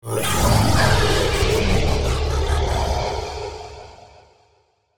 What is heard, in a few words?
Digital Creature Robotic, Monster Sounds; The Decline Of Warriors; Monster Sounds Volume II